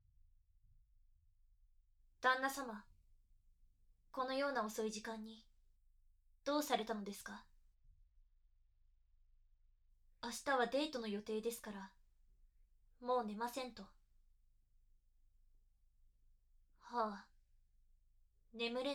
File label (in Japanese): 添い寝; Day6 ララとの休息